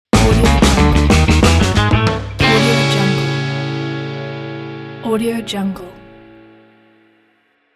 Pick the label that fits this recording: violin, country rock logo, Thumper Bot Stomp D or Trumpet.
country rock logo